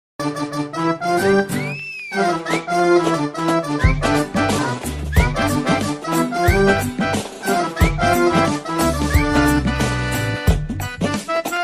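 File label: background music ringtone; pushpaka vimanam new movie; anand devarakonda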